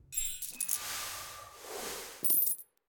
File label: coins fly; silverback